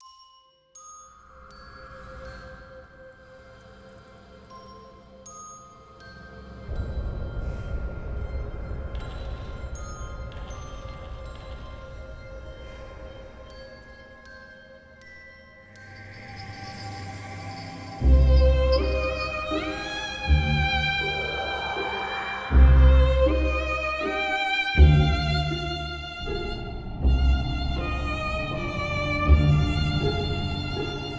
haunted mansion

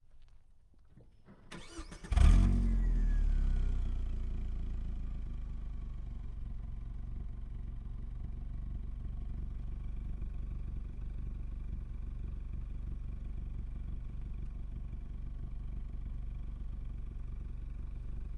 ford probe ext start idle steady blips off; Sonniss GDC

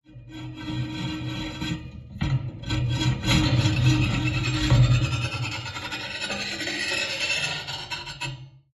metal shelf scrape; sonniss gdc

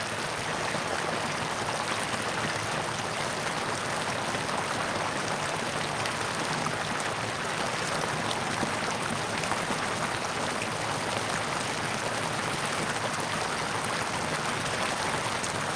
water large stream 1ft waterfall base; sonniss gdc

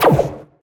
robo laser blast medium; Sonniss GDC

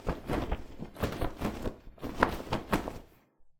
flag; foley; baileywiki maps premium towns

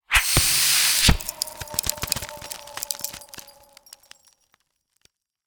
ray of frost; cantrips

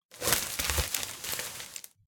rustling large; weather and nature

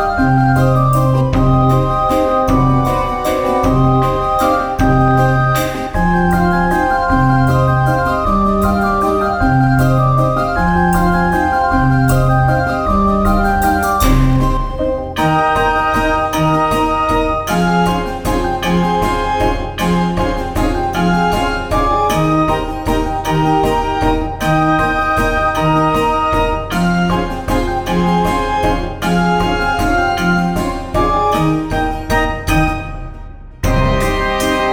Witch Carnival Loop; Big Top

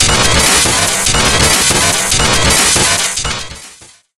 black machine; sonniss gdc

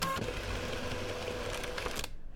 dot matrix vintage printer servo; fvtt sonniss gdc audio

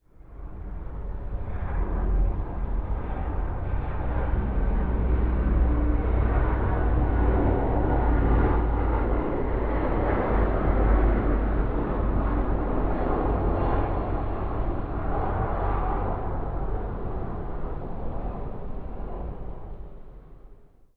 night plane passbys; sonniss gdc

